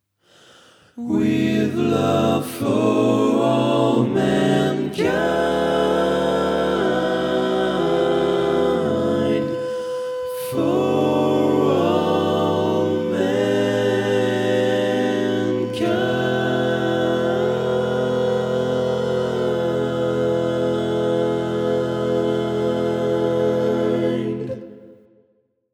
Bass

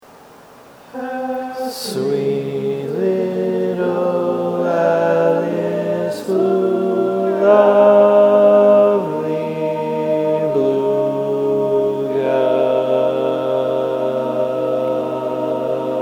Bari, barbershop

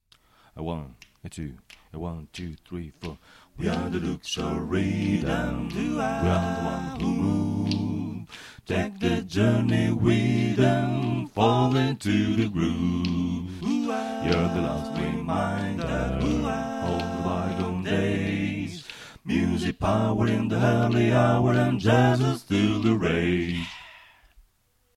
Bari, barbershop

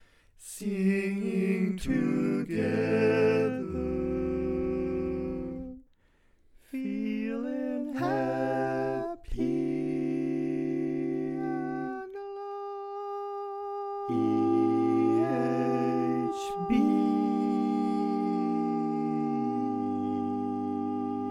Tenor, barbershop tags

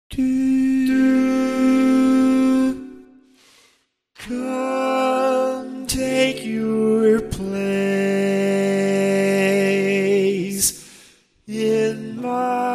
Bari, barbershop tags

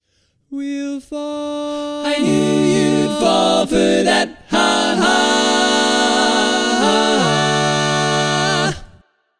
All Parts, barbershop

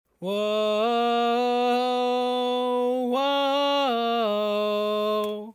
Bari, barbershop tags

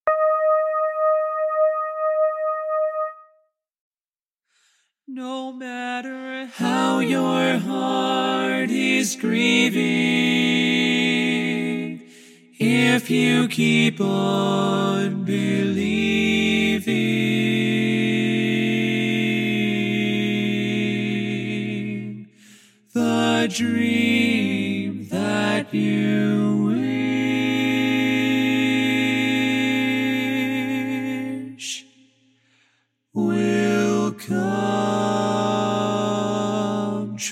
Barbershop Tags, Bari

